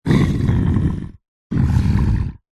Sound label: horror monster creature deep grunts